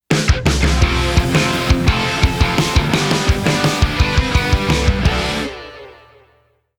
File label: chunky guitar